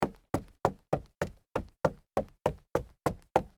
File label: jog up stairs wood